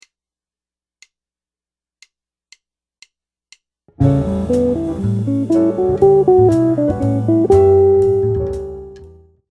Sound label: lick, jazz guitar